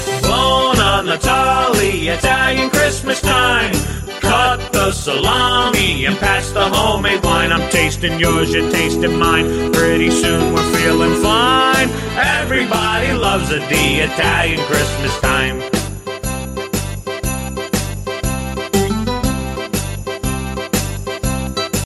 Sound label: funny italian xmas time ringtone